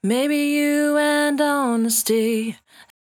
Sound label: 68bpm D, Velvet Vocal; Zero-G